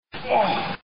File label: pain